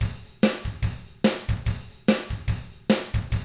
ROCK, Double Platinum Drums

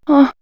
Men Women, vox; Animal Magic; X-Static Goldmine; vox mix

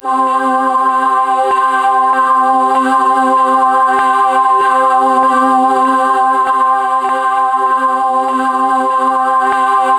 C4, Synth Leads

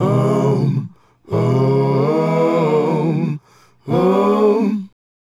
Male Group, Hip-Hop and RnB Vocals

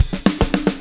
POWR, Backbeat, SHUF

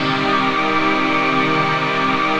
CHRD PAD; Best Service; XX Large Pads; Sample CDs

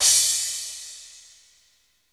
CRASH, DRUMS; Crypton - DrumBase5000

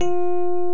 Electric Pianos

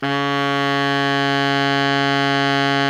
BARI MF C#2, Sax; Giga Samples Collection; BARI QUICK

